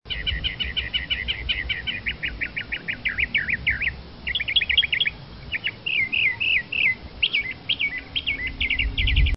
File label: mocker, bird sounds